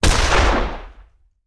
Shotgun; Sound Ideas; Guns

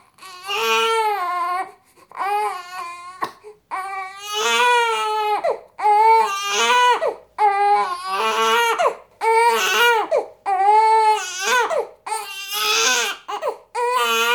baby crying; DeadFright

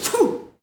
Boxing Female Spit Mouthguard

